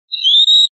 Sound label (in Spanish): rep silbidos, pardillo